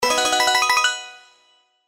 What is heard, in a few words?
Win Wild; slots; Realm Saga